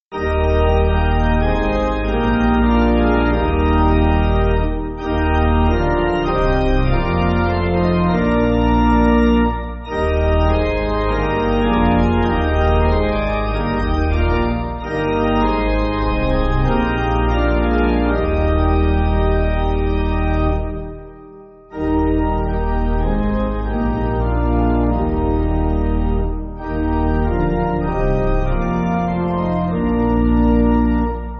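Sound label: Pipe Organ, church backing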